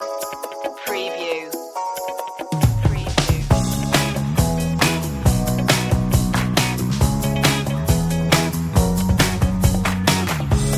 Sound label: pop; urban-rnb